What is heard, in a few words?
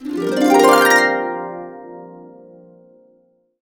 Harps; USB Soundscan vol.62; AKAI